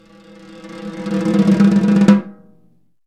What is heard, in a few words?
snare, Roland; LA Composer